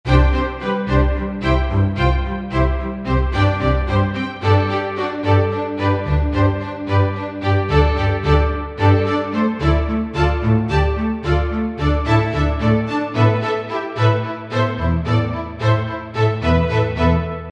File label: String